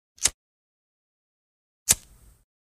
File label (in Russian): kremn zajyga